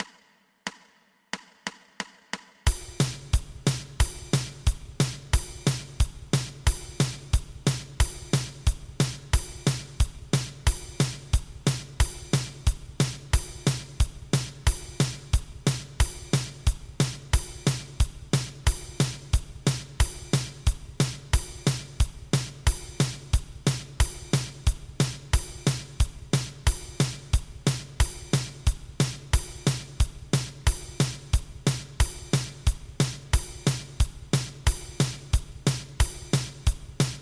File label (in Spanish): 2 pulsos